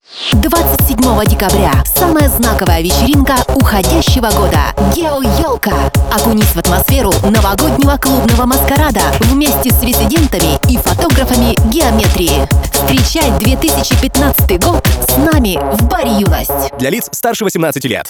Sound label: Electro House